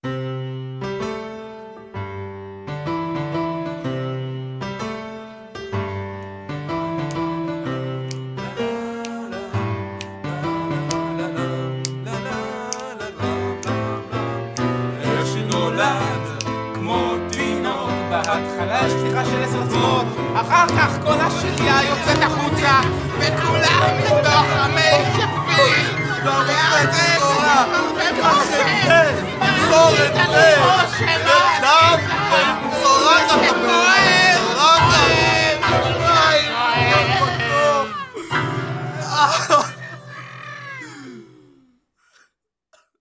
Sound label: baby